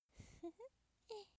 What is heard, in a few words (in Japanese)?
笑い声; ループボイス